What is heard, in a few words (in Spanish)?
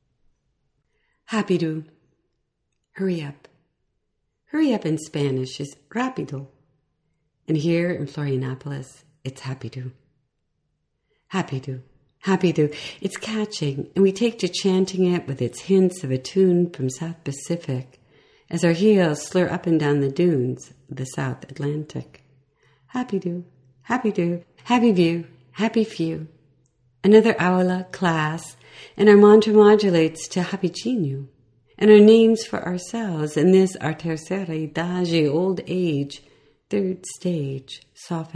Breathing Rapido